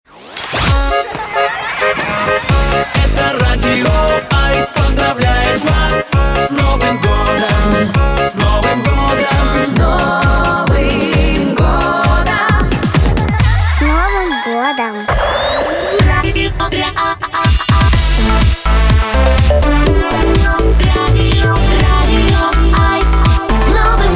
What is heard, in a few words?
Jingles Radio; efir